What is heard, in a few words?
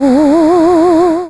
sheep